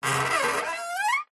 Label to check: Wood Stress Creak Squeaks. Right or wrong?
Right